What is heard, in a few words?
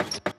foley; TRUTH-HZ; Crowdsourced Sample Pack; Digital FX